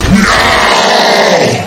death; calamity

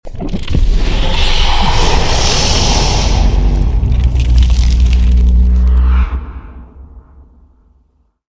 devourer spawn; calamity

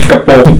kill; ff2

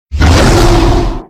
teleport

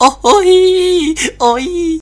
backstab; yuusha rider

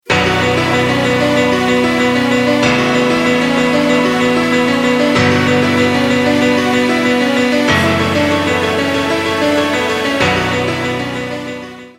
intro music; froststalk

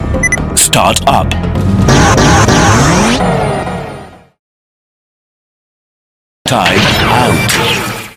startup; yuusha rider; faiz

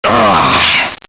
stunned; xinalized

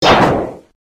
sword; mafioso

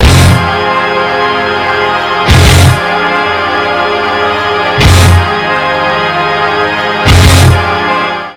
intro music

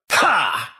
superjump; florentino

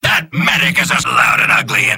kill medic; freak fortress 2; mad machine

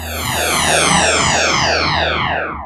super jump; freak fortress 2

